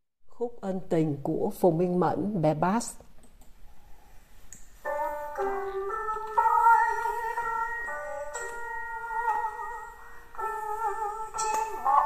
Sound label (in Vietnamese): Khuc An Tinh Bass; ca doan teresa